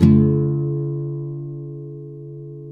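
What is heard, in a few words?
nylon up minor D#, guitars, flam chords; Spectrasonics; Hans Zimmer Guitars VOL-2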